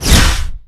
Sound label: Weapons Shock Rifle